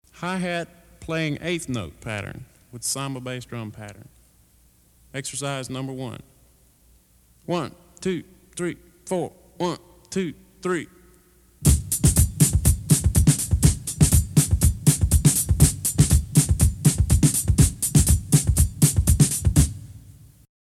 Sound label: Hi-hat Eighth Notes